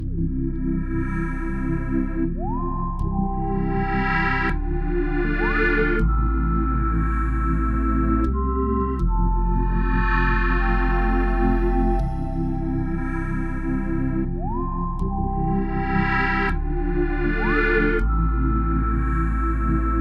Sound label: Pop Mysterious Wedding Chill